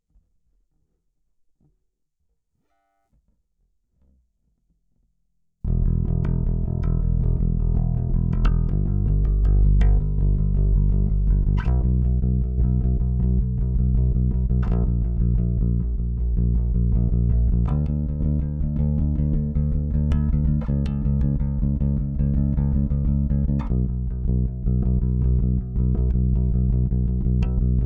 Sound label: Bass Combined